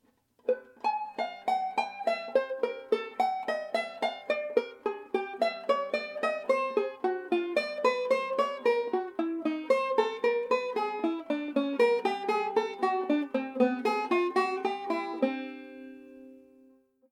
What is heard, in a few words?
Circle Pattern, plectrum banjo, jazz patterns